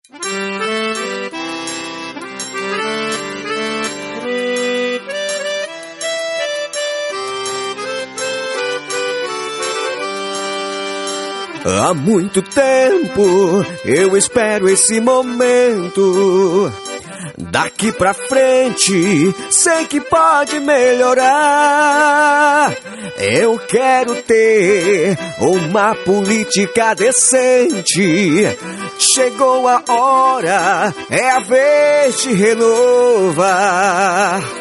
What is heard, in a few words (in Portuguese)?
Jingle politico